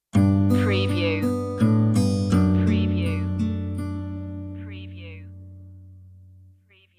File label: stinger